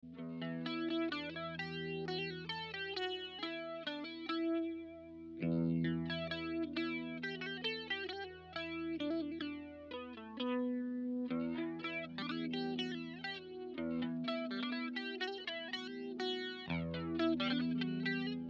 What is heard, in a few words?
Drone; vybrnkavacka